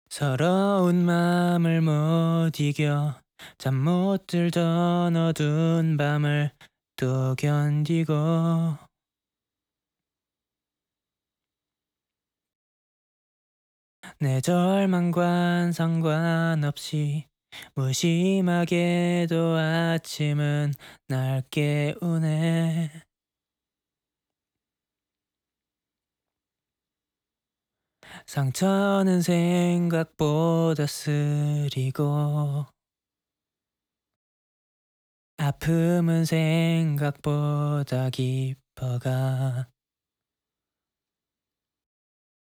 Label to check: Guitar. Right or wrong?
Wrong